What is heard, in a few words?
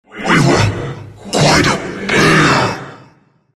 jak death; Dota 2; jakiro